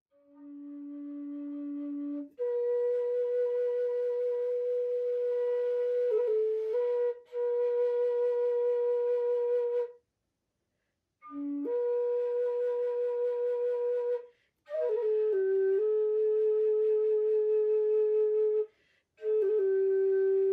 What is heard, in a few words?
Bamboo Nose Flute